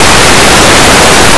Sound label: paper; Blue Heat